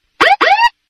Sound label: syren police us; ringtones; sms